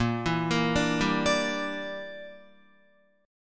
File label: Bb 6th Flat 5th Bb down Guitar; Bb6b5 arpeggiated